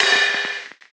Large Room Ride Cymbal A Key, Royality free ride cymbal single hit tuned to the A note.; cymbal drum samples